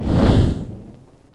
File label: ghost flame